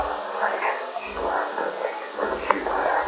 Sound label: laugh; EVP